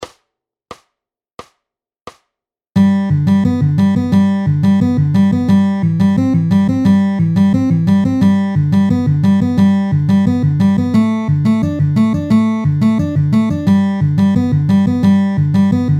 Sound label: rolls lick